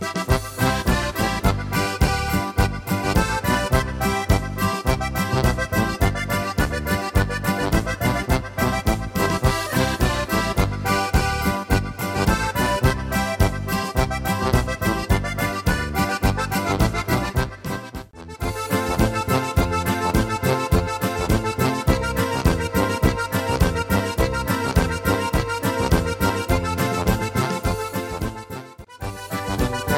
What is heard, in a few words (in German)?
Timple Boarischer